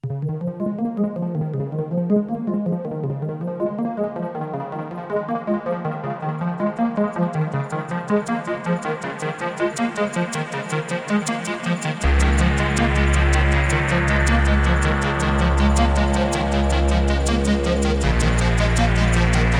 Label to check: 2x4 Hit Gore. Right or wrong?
Wrong